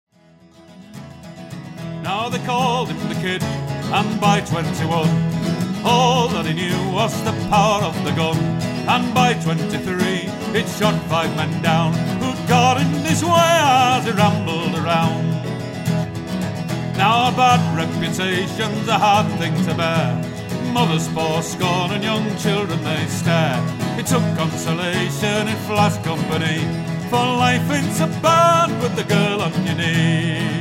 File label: slip jigs and reels